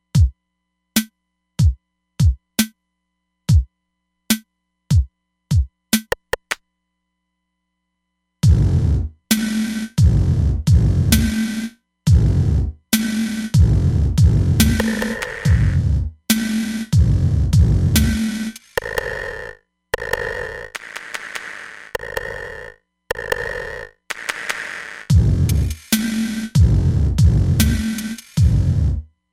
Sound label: Panning Bounce, Roland DEP-5; Factory Patches